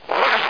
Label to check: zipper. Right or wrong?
Right